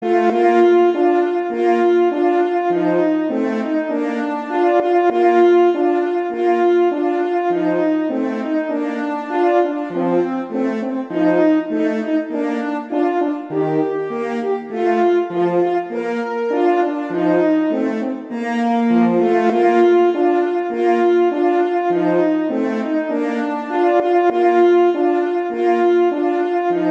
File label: 2 Horns; Christmas; Horn Duets; O Come Little Children